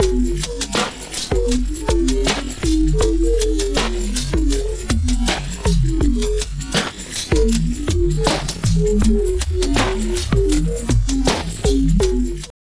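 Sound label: drum loops crazy processed, 80 bpm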